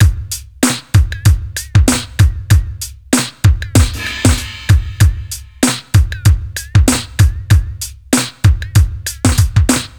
96 bpm drum loop g key, Free drum beat - kick tuned to the G note. Loudest frequency: 1566Hz